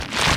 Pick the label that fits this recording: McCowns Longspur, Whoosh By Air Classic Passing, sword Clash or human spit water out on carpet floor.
sword Clash